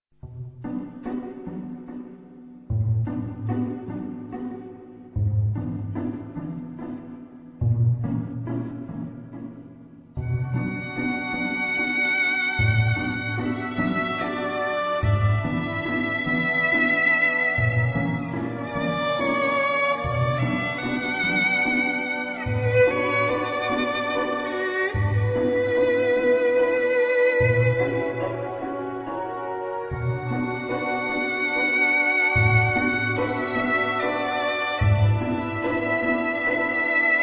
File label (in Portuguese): Musica